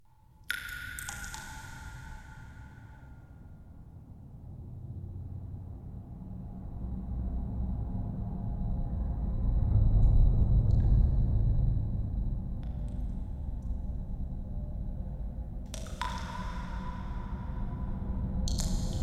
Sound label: cavern